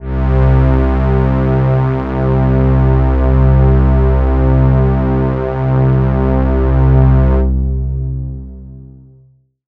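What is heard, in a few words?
strings C2, Oberheim OB-Xa Pads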